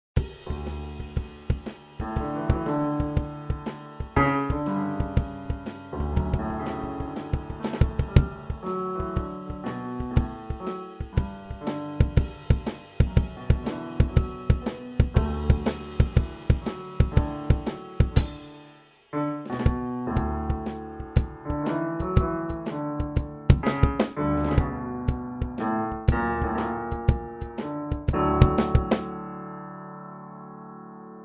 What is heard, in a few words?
Improvist